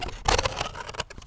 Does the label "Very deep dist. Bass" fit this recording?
No